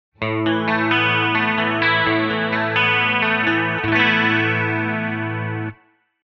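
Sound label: guitar Chorus analog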